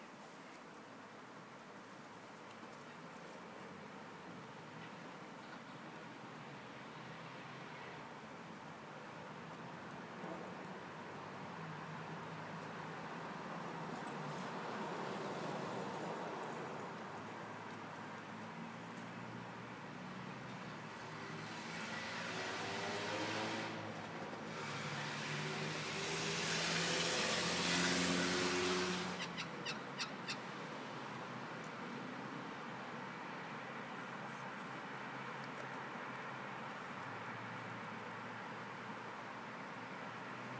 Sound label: car park engine bay of broken down civic cars pass; tennis club; civic cars pass but mine is silent